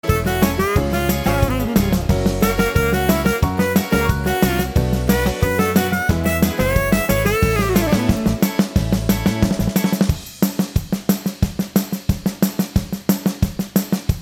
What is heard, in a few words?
medley twist